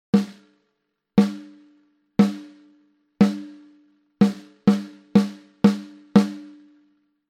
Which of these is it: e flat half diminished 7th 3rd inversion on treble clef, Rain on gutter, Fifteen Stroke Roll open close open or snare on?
snare on